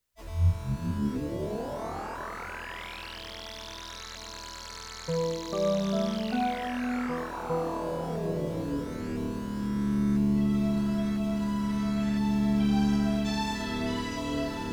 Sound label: Instrumental